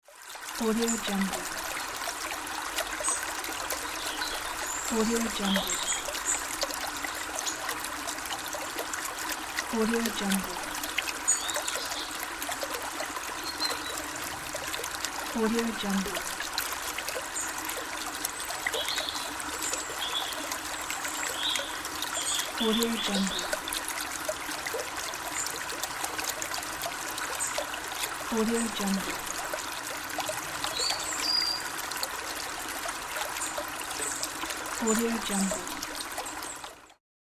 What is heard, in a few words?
forest stream; sound-create